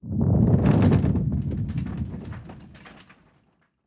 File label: Boom Effect; blue archive